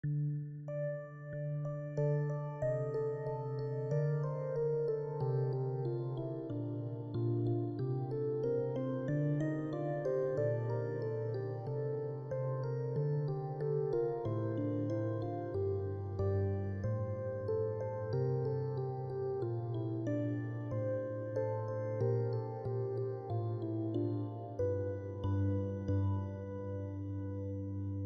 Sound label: keyboard; Portavit eum ventus in ventre suo